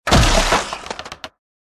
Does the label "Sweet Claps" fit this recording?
No